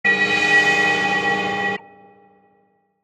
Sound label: Metallic Textures; Blastwave FX